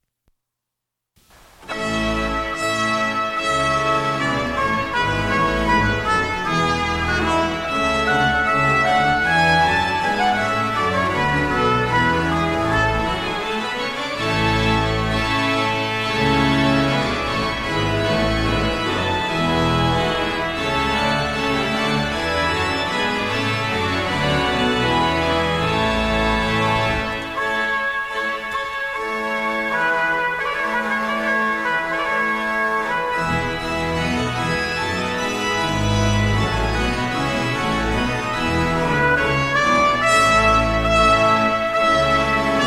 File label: Rigaudon